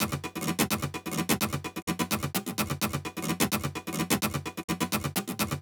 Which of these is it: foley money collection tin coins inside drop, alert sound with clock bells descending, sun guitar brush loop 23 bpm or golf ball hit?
sun guitar brush loop 23 bpm